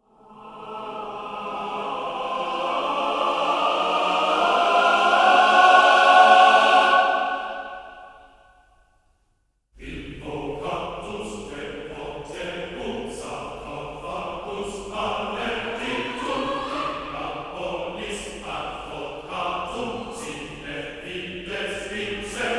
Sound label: Choir; трейлерная музыка